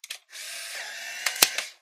polaroid, Machines

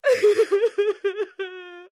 crying, Human Female; Voice

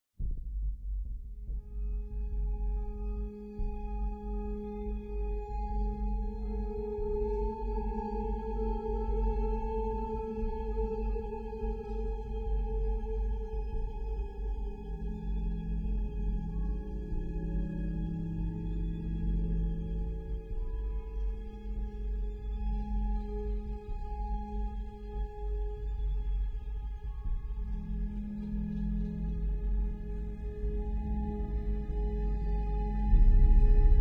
station, Ambience

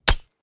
collide, revolver; Items Weapon

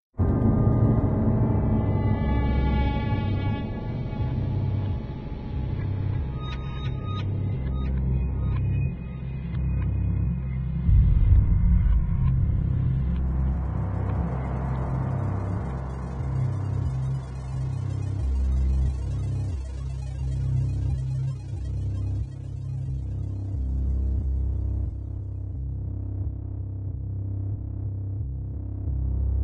space, Ambience

